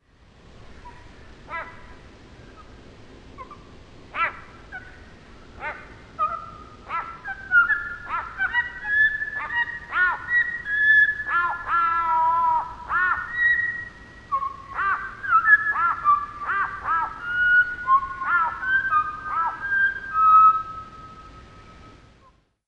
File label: Grey Fantail (Slowed 4x)